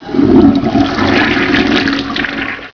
flush; Toilet flush #2 (short)

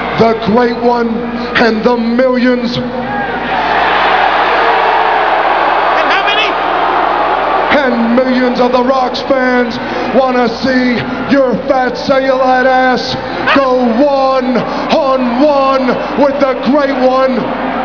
rock

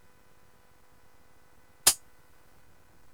hats, fm synthesis; John Chowning

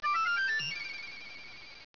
whistle

